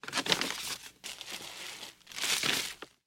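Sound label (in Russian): упаковка бумажных документов в файл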